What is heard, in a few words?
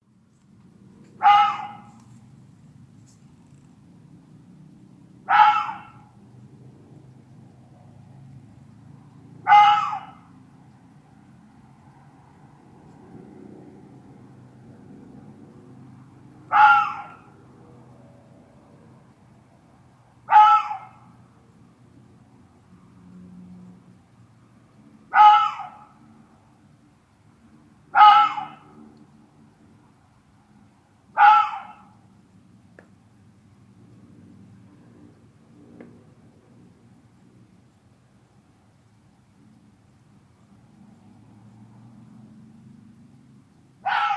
Barking fox